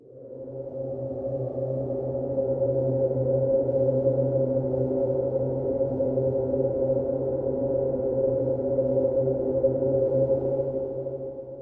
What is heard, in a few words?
Pad C, Synth Prophet One Shots; Electronisounds